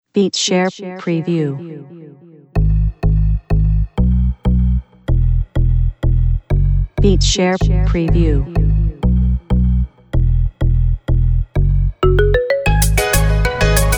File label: reggaeton sample bpm95